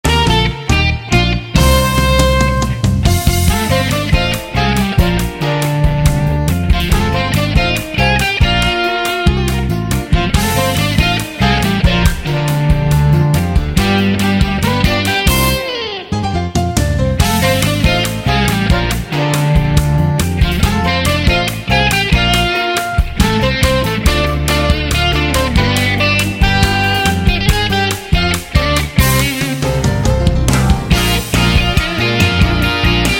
片头音乐

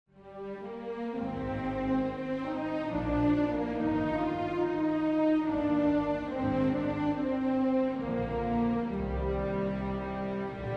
深沉的背景音乐; 3分以内

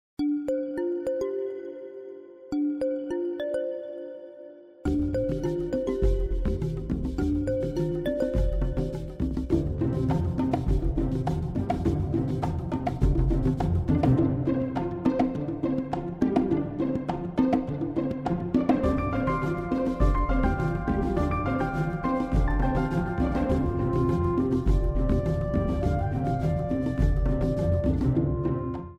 专题片背景音乐

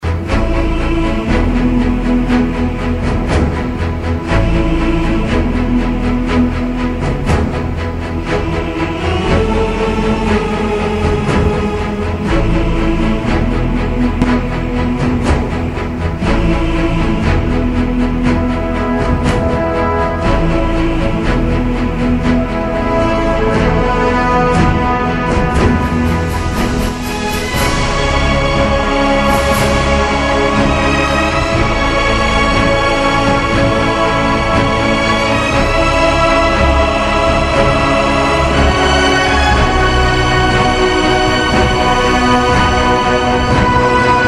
宣传片背景音乐

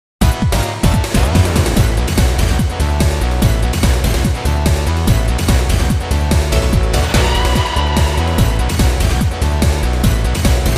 纯音乐背景音乐

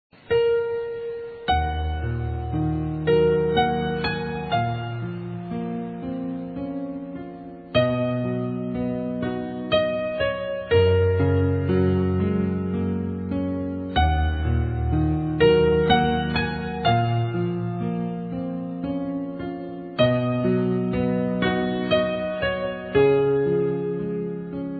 伤感的背景音乐

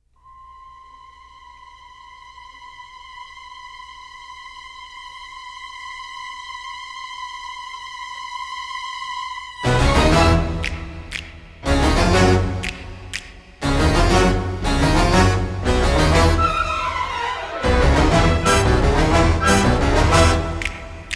电影音乐; 奥斯卡电影音乐

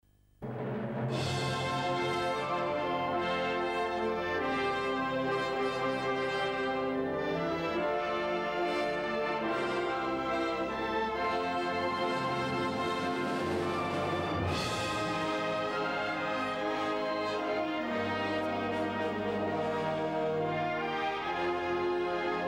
片头音乐

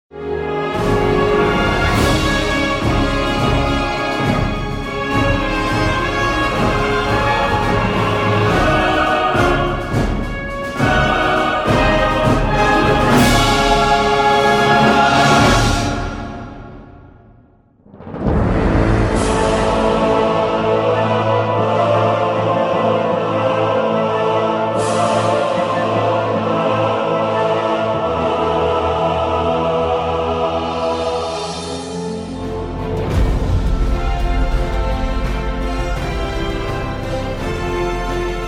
激情的背景音乐

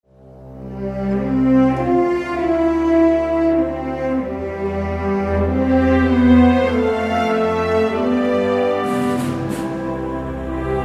抒情的背景音乐

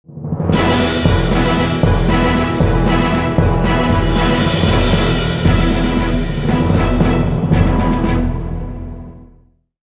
大气的背景音乐; 30秒以内

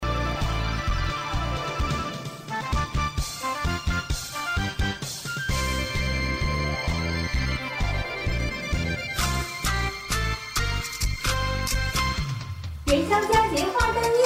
歌曲翻唱 快板说唱 年轻

